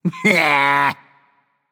nv tongue; smite; voicepack; hachiman

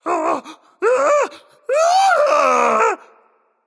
fear, voicepack; smite; jorm

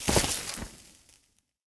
fire impact; smite; poseidon

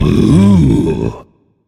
ugh; smite; zeus

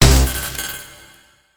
Hit; smite; raijin

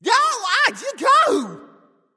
ouch; smite; ahpuch

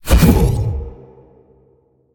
brain blast; smite; god cthulhu

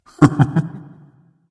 hehheh; smite; voicepack; thanatos

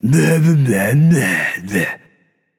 nv tongue; smite; voicepack; hachiman

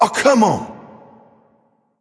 grunt hurt

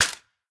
clap; Smite; god Lancelot

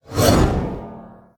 whirlpool trident swing; smite; poseidon

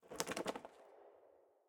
gugnir feathers; smite; god odin

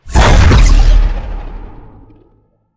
sword impact; smite; heimdallr

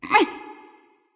lift grunt; smite; awilix

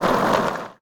skateboard land roll; smite; loki

